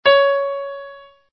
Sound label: cis2 des2, keyboard sounds